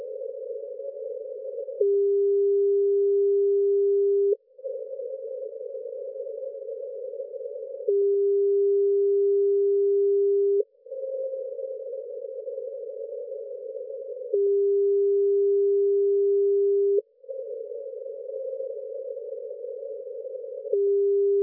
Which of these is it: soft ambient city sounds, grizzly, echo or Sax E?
echo